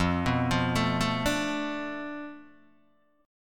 F 6th Flat 5th F down Guitar DADGAD, chord

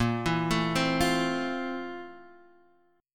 F Major 7th Bb, chord; Fmaj7/Bb arpeggiated; JGuitar

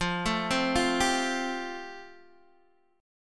F Major Add 9th Guitar DADGAD

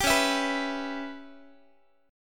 C sharp Minor 7th Flat 5th C sharp Guitar; C#m7b5; strummed; 22,21,19,21,x,x

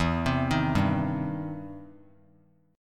E Minor Major 7th Sharp 5th, E, down; EmM7#5 arpeggiated

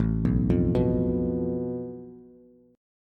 A Suspended 2nd Sharp 5th, Bass; arpeggiated; JGuitar

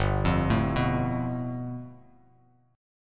G 9th, Bass Standard; G9 arpeggiated

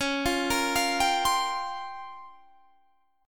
A sharp Minor 6th Add 9th C sharp, Guitar chord

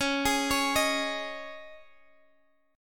C sharp Suspended 2nd

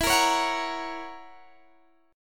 F sharp Major E; F#/E; strummed

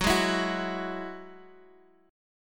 Gb 7th Suspended 2nd Gb down; Gb7sus2 strummed

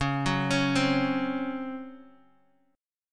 C sharp Major 7th Suspended 4th, Guitar, DADGAD; x,4,4,6,3,x

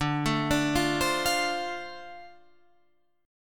F 6th D, chord

